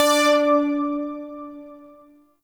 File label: Shawzin Oct Three D; Music; Warframe; Zariman